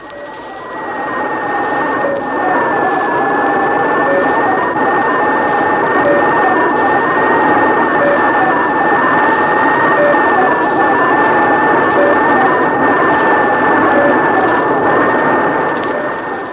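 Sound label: Alouette 1, satellite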